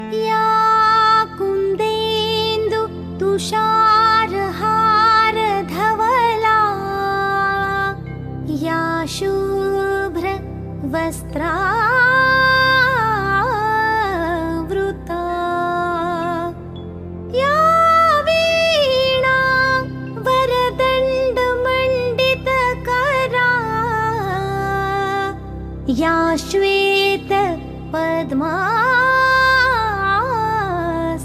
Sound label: Saraswati Mantra; Ya Kundendu